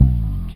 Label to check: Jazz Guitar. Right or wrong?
Wrong